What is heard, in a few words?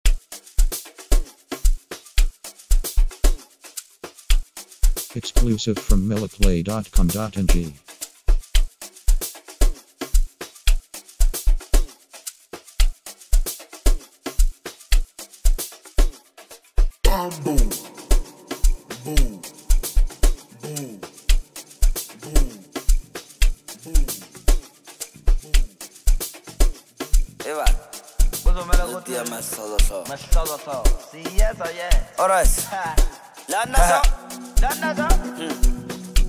Thunder Storm